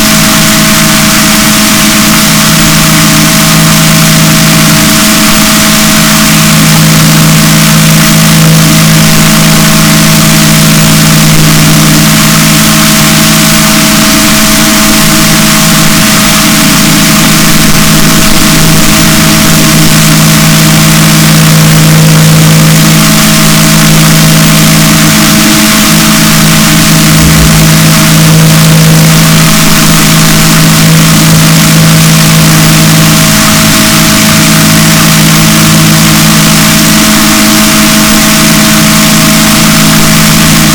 rorquals negatif, boat

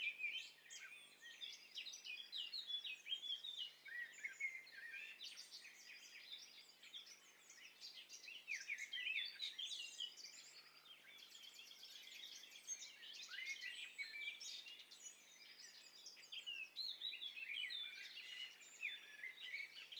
STEREO BIRD COCKTAIL PARTY

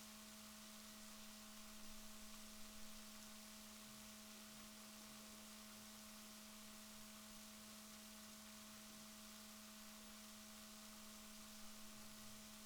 rorquals negatif, Balaenoptera Physalus Chile

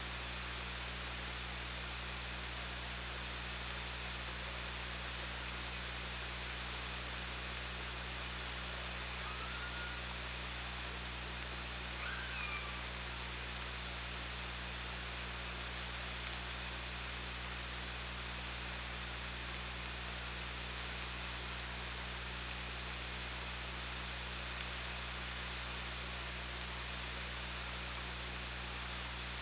OrcaLab

